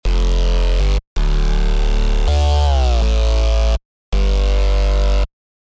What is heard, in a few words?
Bass; PatchFactory